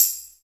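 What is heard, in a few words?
TAMBOURIN, drum machine; Drum Mashines; EdgeSounds; JD DRUMS KIT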